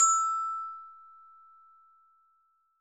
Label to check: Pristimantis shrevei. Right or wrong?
Wrong